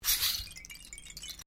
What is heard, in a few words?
RAINSTICK SHAKE, Hand crafted decorative rainstick; Shaker; Musical